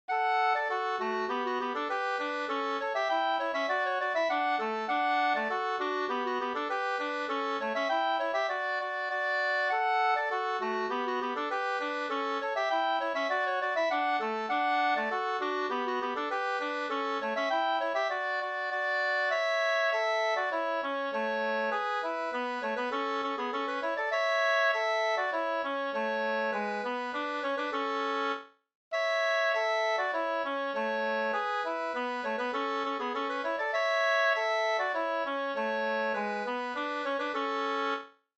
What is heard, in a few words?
polka